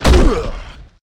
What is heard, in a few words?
Football Hit Tackle